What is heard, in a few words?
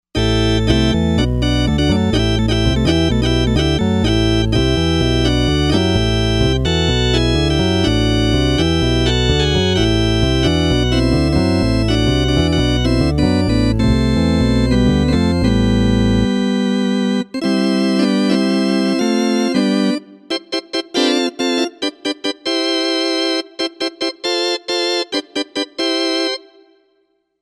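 organ Combos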